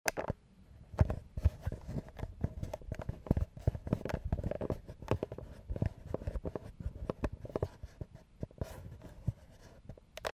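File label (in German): Zoom H2 Handgeräusche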